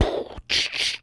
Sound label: gun shot